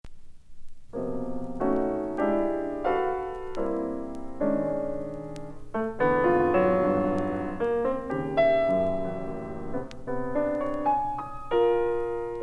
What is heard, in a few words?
two piano pieces